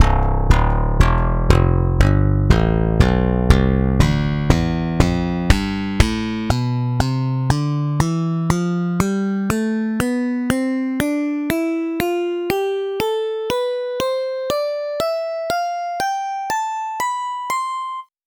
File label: slap bass tonleiter